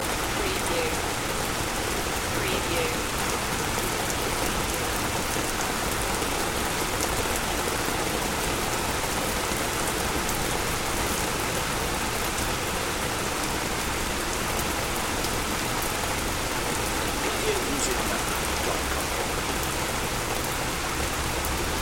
Rain

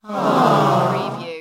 Crowd Aww

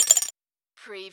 CARTOON RATTLE

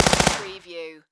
Submachine Gun HK MP5

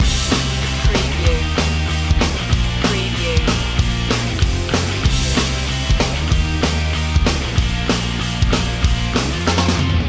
punk shuffle loop

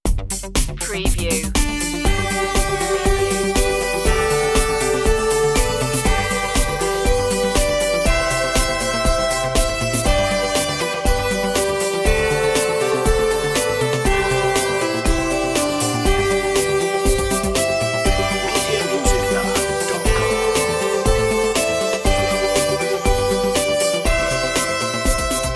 auld lang syne synthified

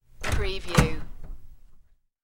Door Knob

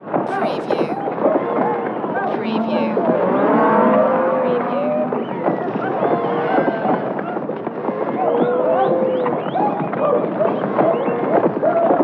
Cattle Stampede Cowboys

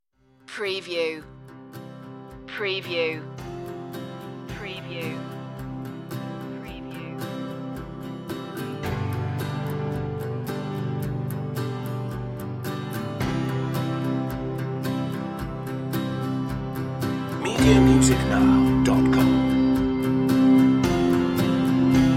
underscore

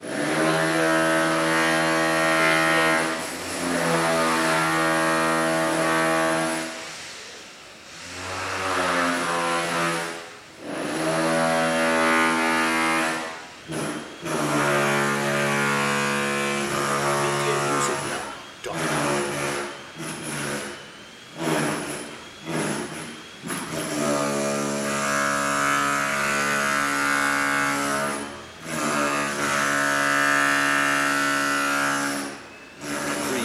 circular saw

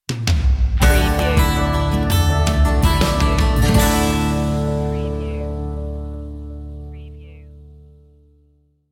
water gardens sting

